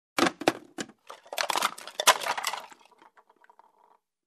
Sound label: Wooden Pieces rattling